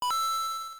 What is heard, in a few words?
Coin